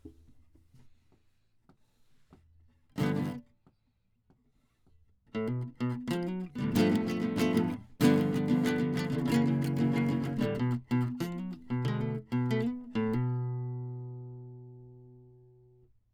Classic Guitar

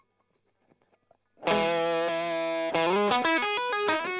Strum Line

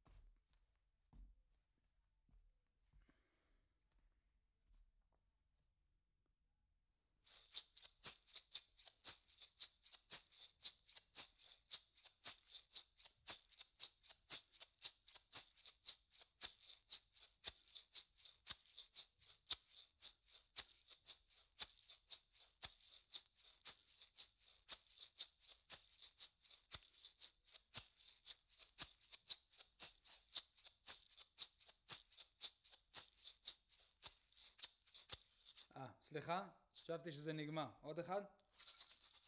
PERC DYN

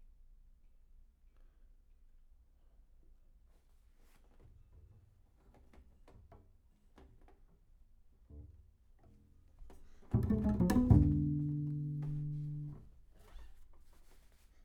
Bass

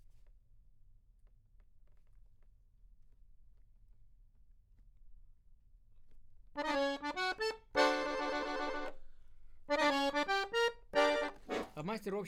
Accordion

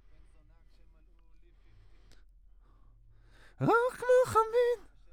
Voc OD

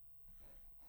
C Bass DI

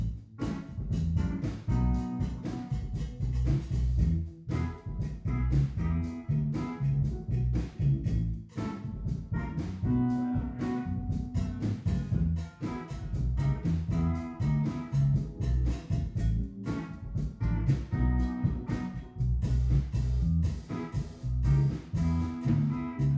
Piano R

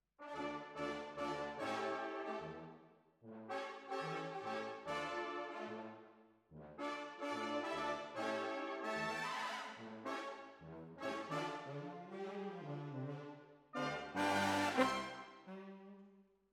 Horns

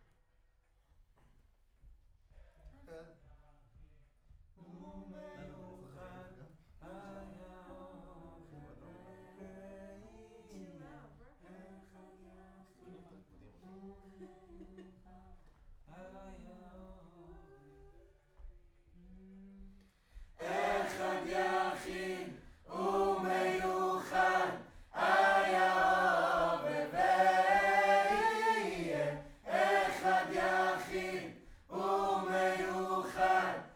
Choir Far

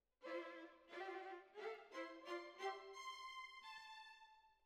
Violas Over

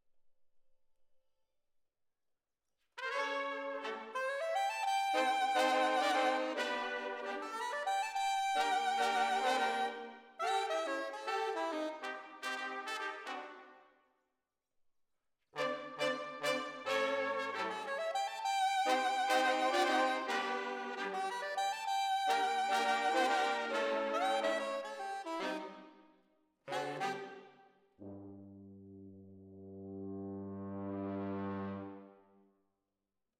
ORTF, Jazz for piano

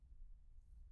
Room

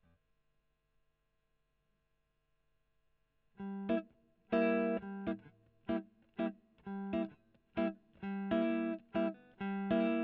E.GTR Gefell

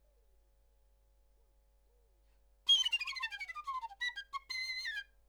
Flute, RNR for piano

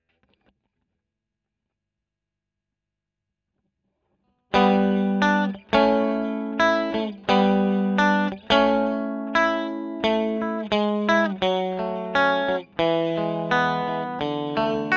E. GTR Royer

